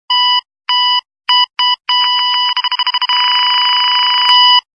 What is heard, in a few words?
fox alarm